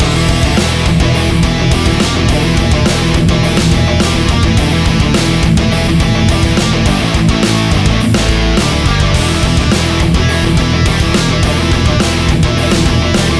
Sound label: rock, bgm; pictcode